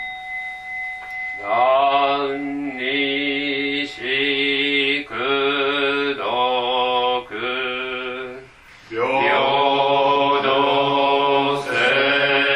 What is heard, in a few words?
simple 3 note melody